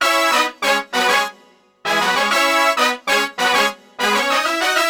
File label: Synt; FUNKACID3